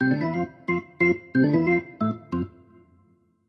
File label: Organ; Fourth of July 2019